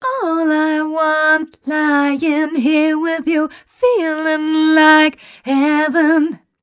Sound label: f mel e flat, fem Vocals